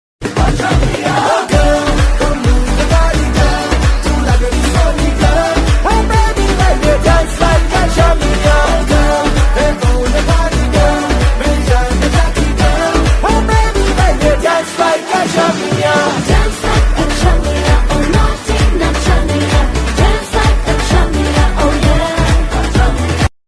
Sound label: hindi ringtone